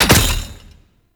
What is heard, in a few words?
Grenade Launcher Dry Fire; Warframe; Scaldra Grenade Launcher